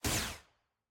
skill aah; shaco; skill 1

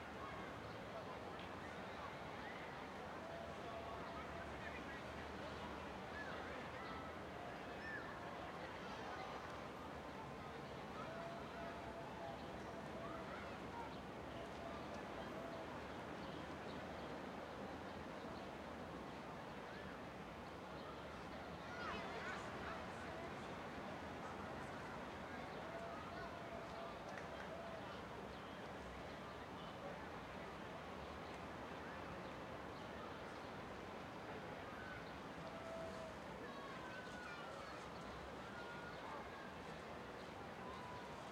amb

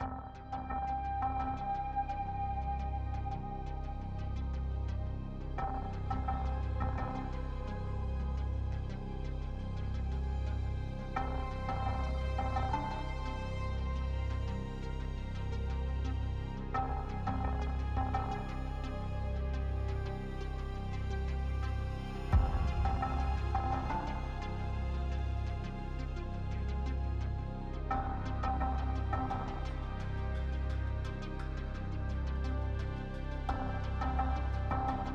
music in queue; project

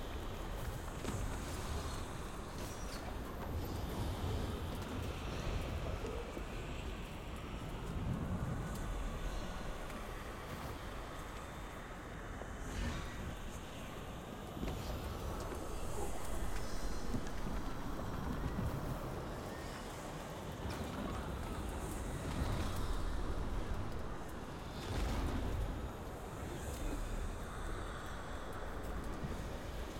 amb demacia streets

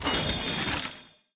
victory point bonus bar fill; clash; lol

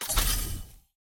unlock; map opponent

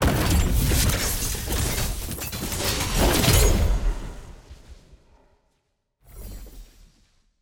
tier wings promotion; to master; ranked

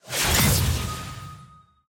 ranked ui promo win; postgame

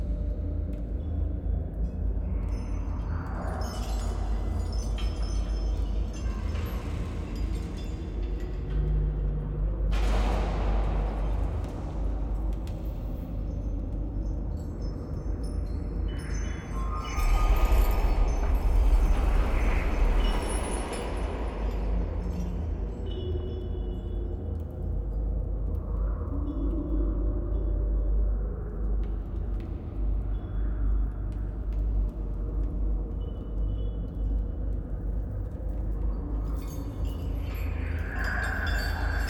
amb

